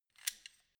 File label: wrench open light; schrauben drehen hydraulik